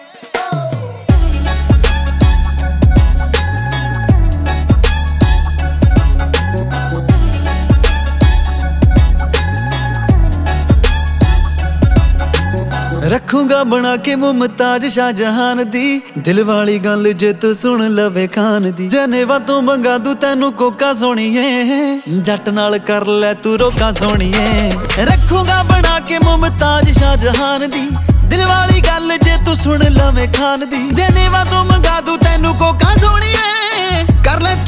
Punjabi Song Ringtone

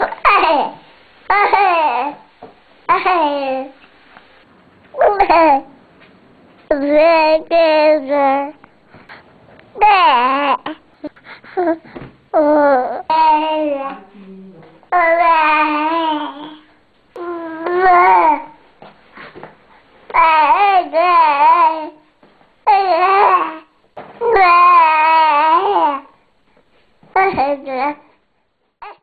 Baby Sound Ringtone

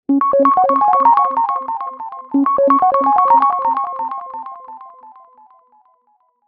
Sms Ringtone

